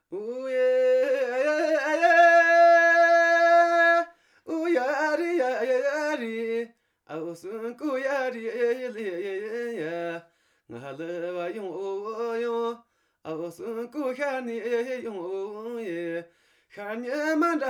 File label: Dpon ru Folk Song